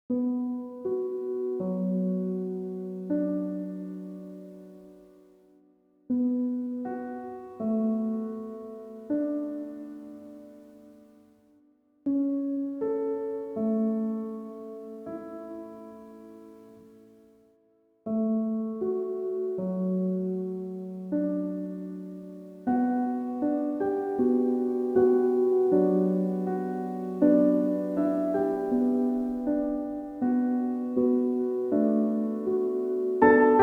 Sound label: Instrumental Piano, Easy Listening Piano, Piano Relaxation